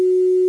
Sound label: Fis 45 p 32